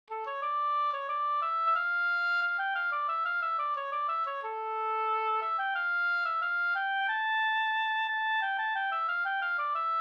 skanklat

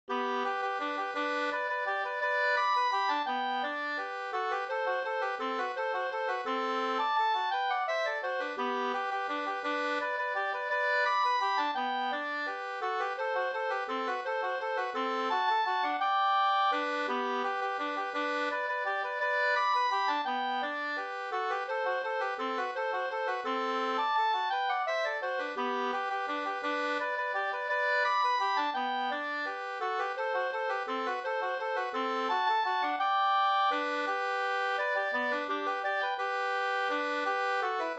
vals

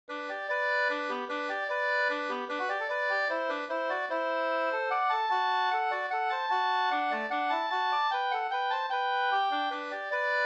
vals; Osteraker

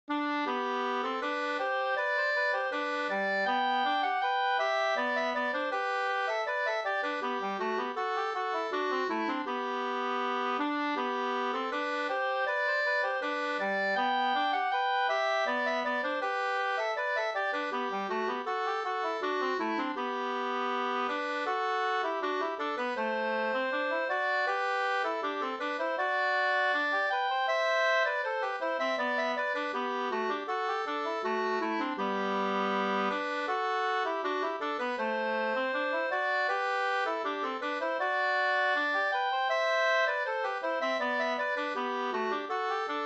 brudmarsch

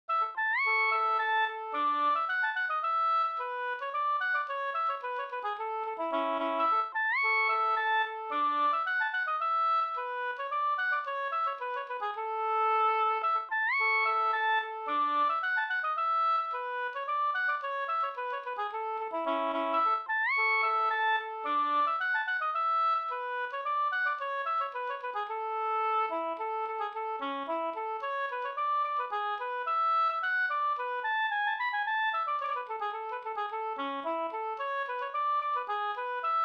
polska Bingsjopolska; e Slunk Jerk Nr2

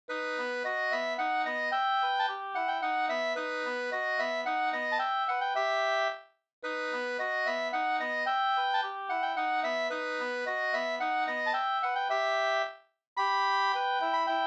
polska

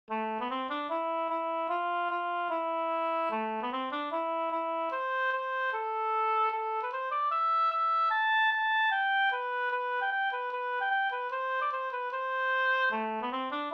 schottis; Morfars schottis Nb

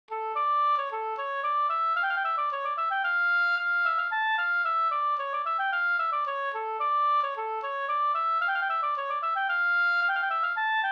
polska Senpolska

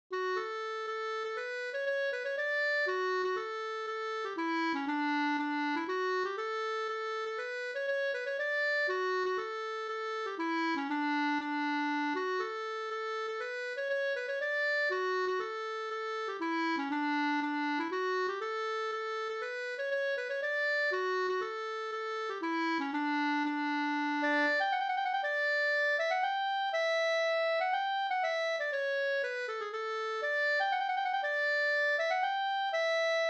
polska Bodapolska

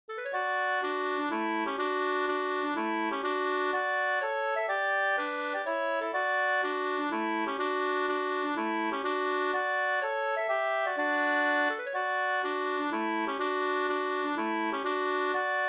hamburska; Urburskan Sd

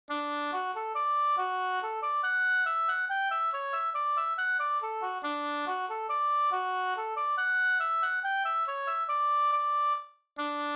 mazurka

